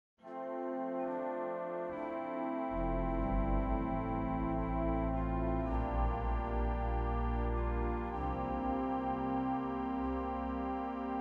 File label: Organteq String Stops; Estrellita; Ponce